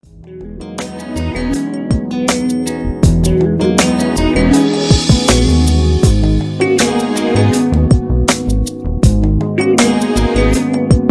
groove, Beats

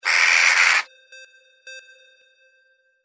CROW, Crow Jackdaw; Crow Jackdaw 20A

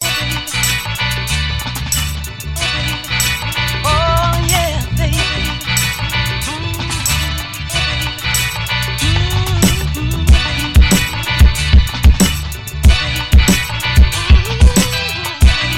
beat

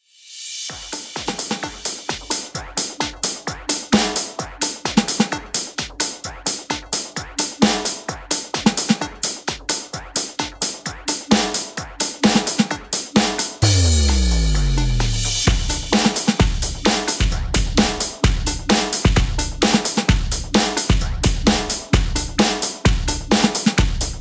mainstream 135bpm

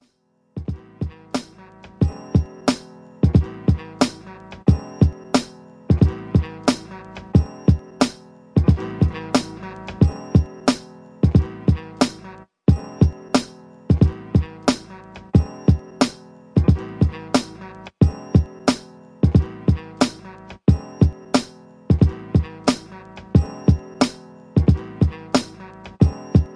Soul Jazz Funk; HipHop beat over sampled

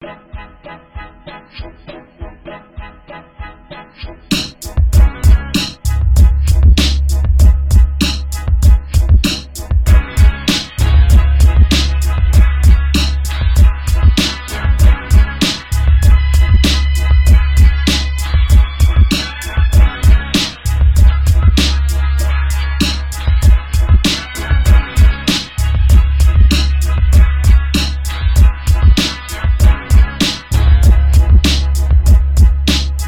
beat

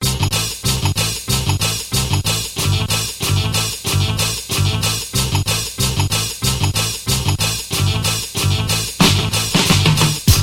beat

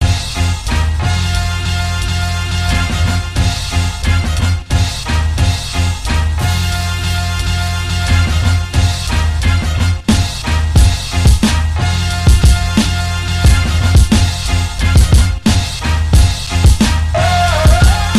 beat

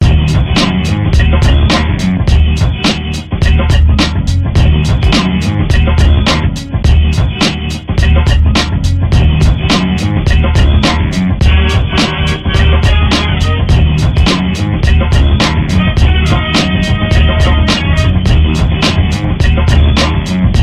beat

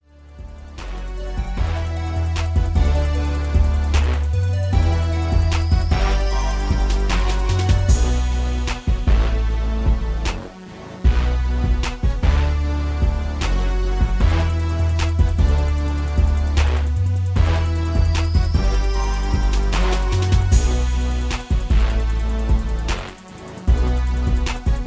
76BPM

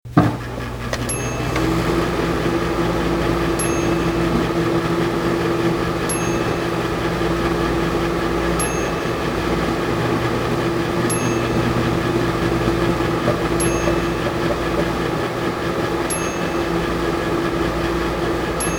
Microwave

